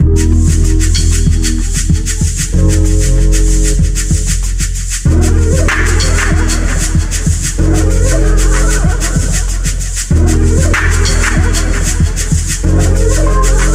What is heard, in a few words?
shakesnare with anime